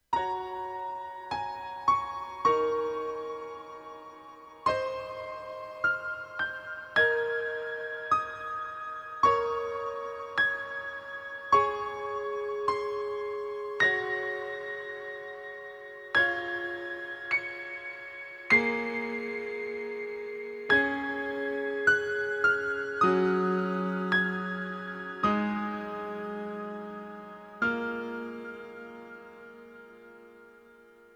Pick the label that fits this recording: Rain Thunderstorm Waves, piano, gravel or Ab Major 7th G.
piano